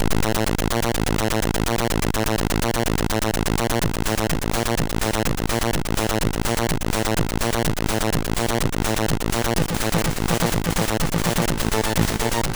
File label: Techno, MOS6581R2